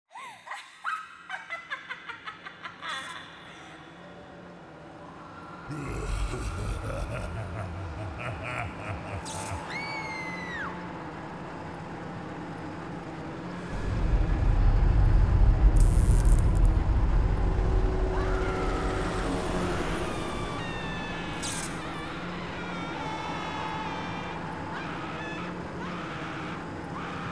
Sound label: Nightmare Ambience; Carnival Cat Resource Tracks